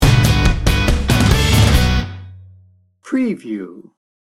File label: night work sting bumper